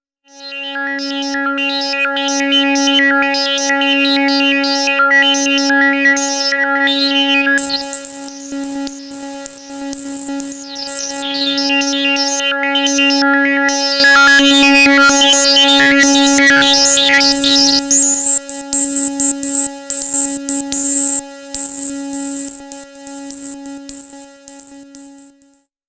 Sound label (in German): Blubber SEM